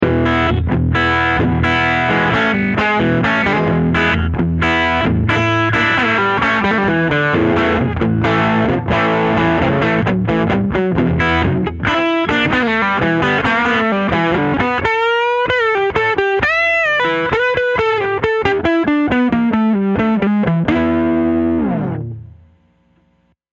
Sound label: overdrive